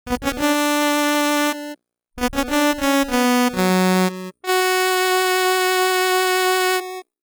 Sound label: Conch Horn; Siren's Instrument; Legend of Zelda The Link's Awakening